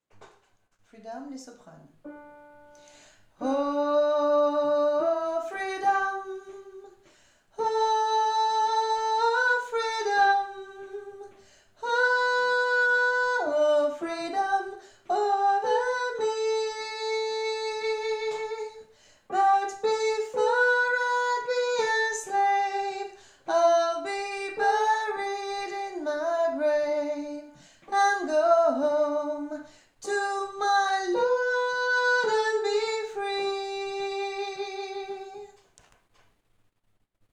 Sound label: freedom soprano